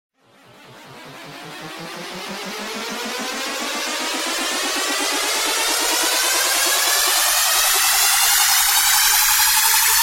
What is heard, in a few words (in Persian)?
Azhiere Police Remix; sedaye azhire police